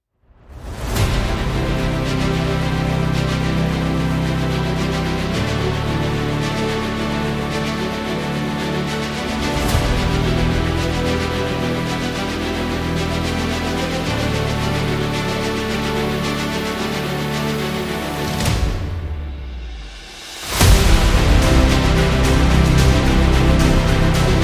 Cinematic Motivational Trailer Adventure

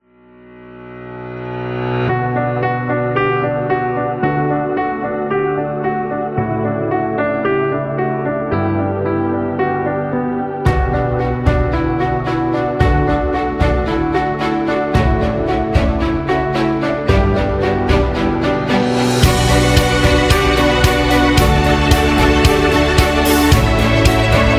Melancholy

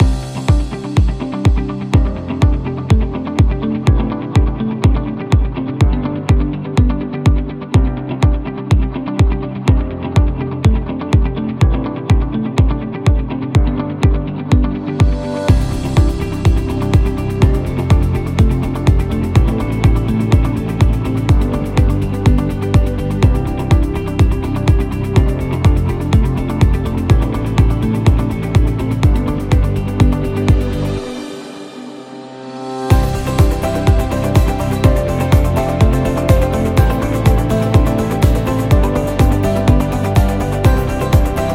Uplifting Loop; inspirational motivational

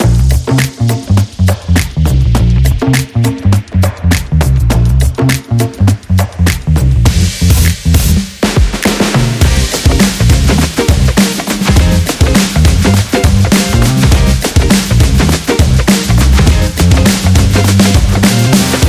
Retro Groove

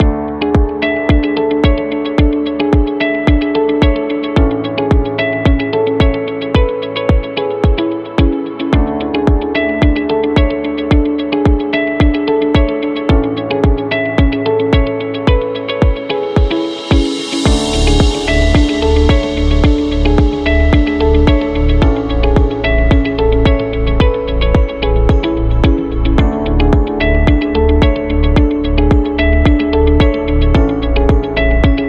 Lounge

